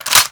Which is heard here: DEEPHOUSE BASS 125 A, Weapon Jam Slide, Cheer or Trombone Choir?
Weapon Jam Slide